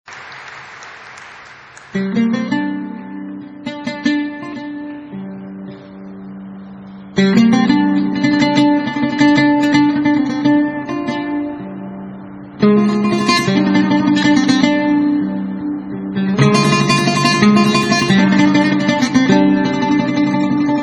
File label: Oud Solo